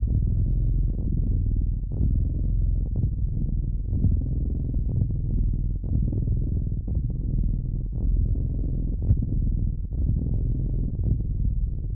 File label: bellbeast snore loop vibration; Hollow Knight: Silksong